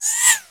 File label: FX CYM R; E-MU Producer Series Vol. 2; More Studio Essentials; Composer; Technopourri